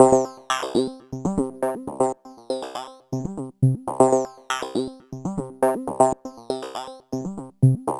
Synth

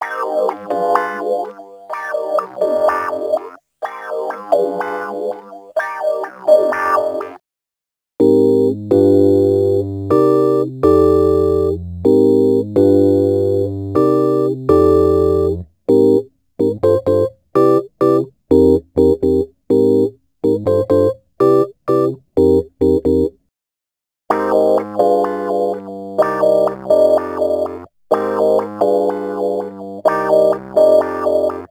Rhodes Attacks; Ueberschall

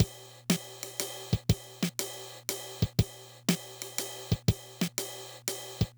Loop, dd-5

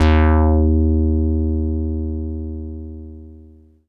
d#3, Korg Poly-800; Booty

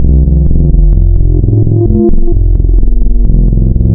Spectral, wavetables; Architecture 32

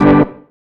Stab, Organ, One Shots

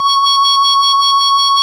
ORG Vox C#, Continental Vox Wah Bar; Roland LCDP09 Keys of the 60s and 70s 1

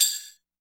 Sleigh Bells, Latin Percussion, One Shots; Sonic Foundry (Sony Creative Software); Joe Vitale Latin Percussion

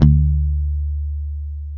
SAWM D.3, Bass; Spectrasonics; Bass Legends CD 1

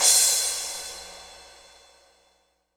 IMP CYM CRASH, Cinematic Impact; M-Audio Pro Sessions; Liquid Cinema; Drum

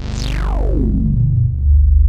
FAT BUGGR, BASSES, Synthsonic; Pro-Rec - Synthsonic

